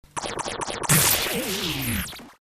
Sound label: blast